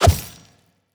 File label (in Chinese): 轻攻击